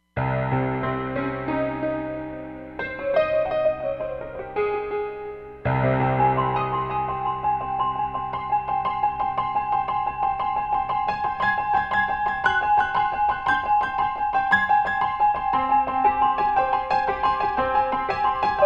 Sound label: FX Piano, Roland Fantom XR